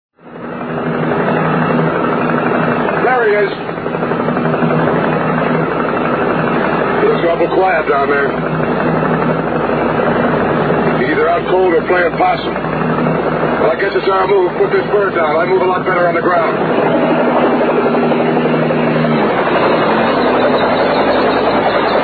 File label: copter